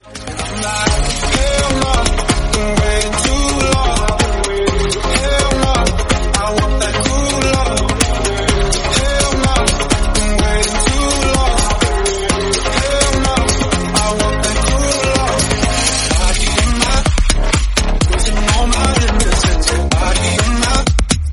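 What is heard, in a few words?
126 bpm D flat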